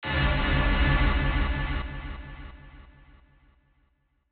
Sci Fi Hit Laser Delay Low; Lasers

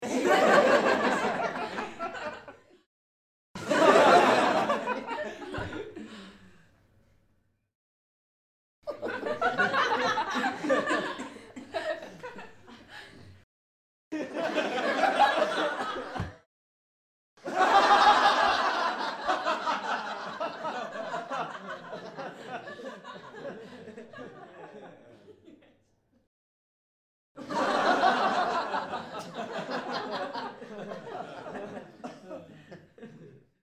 Crowd-Small Group Laugh Series; Small Crowd , Mixed Group Of Men And Women Laugh - Several Cuts; Laughter; Voice

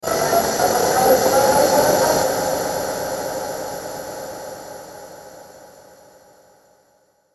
static high; Static High Reverb Garble; Production Element Imaging Element Accent Transition; Blastwave; Static Sound Effects

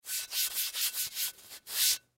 Statue Dust Off Rub; Cleaning Sound Effects; Blastwave; Foley

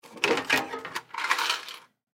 Cash Box Drawer, Cash Box Take Drawer Out; Money; Office; Objects; Blastwave

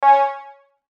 Synth Blip Warm; Beep; Multimedia; Blastwave

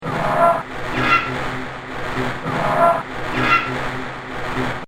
Industrial Loop, horror; Industrial Loop 3 - Horror Fx; Miscellaneous Loops; Andrew Fleming

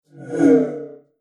Future Vroom, whoosh sound effect; Future Vroom 13

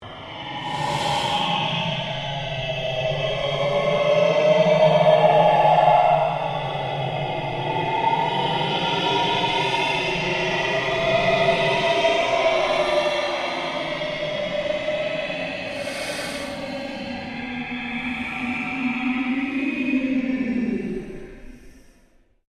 Ambient Drone Ghost Chatter

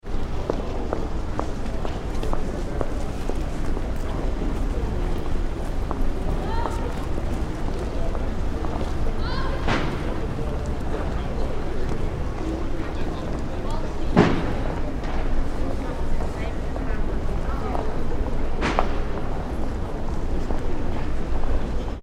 ambience urban city street pedestrian quiet; City Or Town Street Ambience - Pedestrians Walking And Talking With Some Banging From Deli; McKinneySound

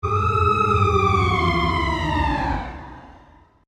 Created Cyber Whale Call, Alien Sound Effects; Created creatures, vocalisations and pretend speech

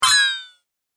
Arcade Game Fast Bright Zoom, Retro Inspired; Video Game Sound Effects

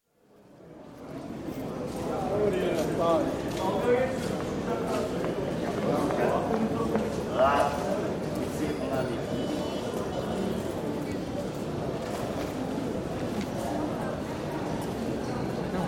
Istanbul, spice market, with footsteps and voices; City; Cities; BBC

